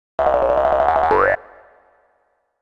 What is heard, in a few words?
Blue Egg Max; Banjo-Kazooie